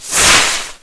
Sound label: Launch